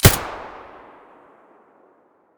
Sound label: aps muzzle stereo; STALKER; IWP